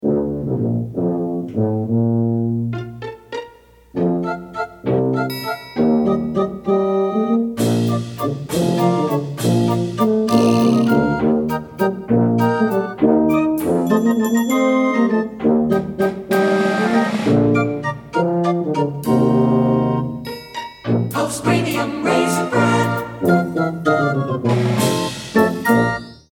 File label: circus score